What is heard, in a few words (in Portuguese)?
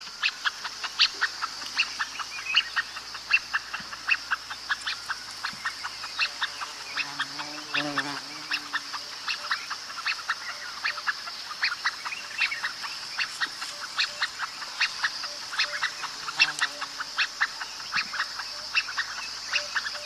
canto do ganso de asa espora